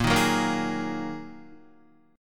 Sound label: Bb 7th Suspended 4th Sharp 5th Bb Guitar, chord; 6,6,4,3,3,3; 4E,4A,5D,5G,5C,6F; strummed